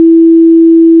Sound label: e open, Pitch Pipes